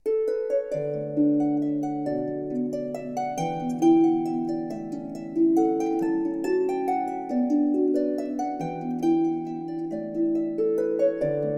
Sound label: Maple Walz